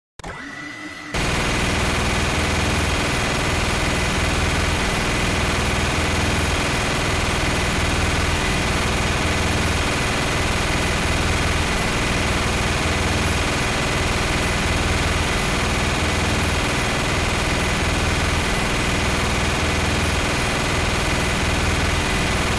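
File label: strelba minigana, minigun fire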